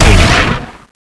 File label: guard hit; dragon